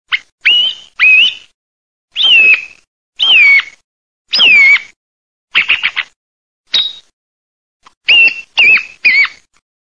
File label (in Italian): TORDO